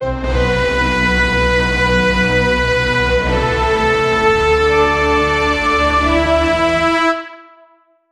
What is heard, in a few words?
dramatic score for a tens